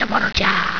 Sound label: pain; alienarena; grey